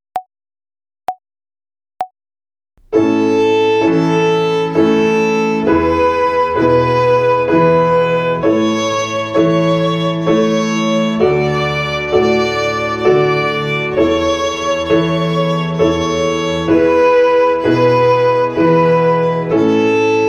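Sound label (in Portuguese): Ginástica até o dedo 3 - Corda LÁ - Ternária, Violino; Violino para os Pequeninos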